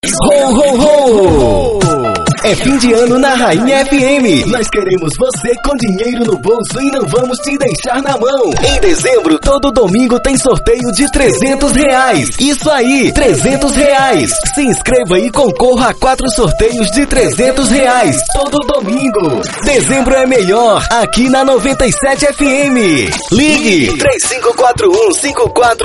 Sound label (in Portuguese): vinheta